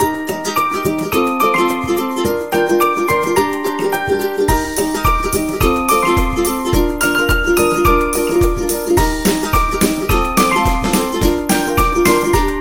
Funny Holiday, happy music

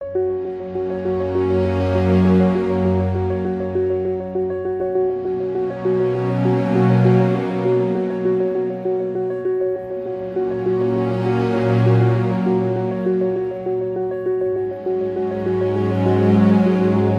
Ambient Calm Music

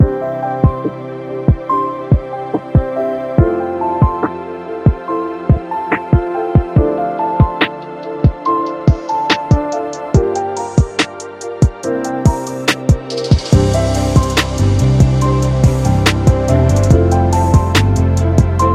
Hip Hop

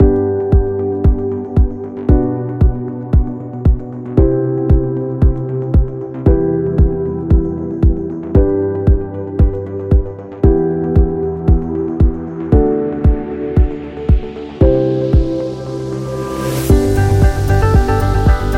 Technology Corporate Background

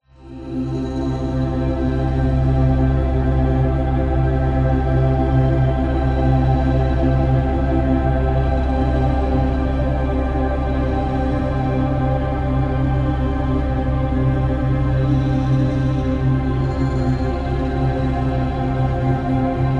Meditation Ambient

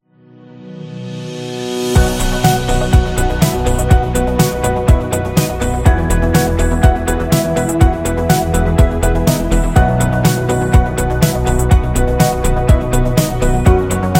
On Hold Music

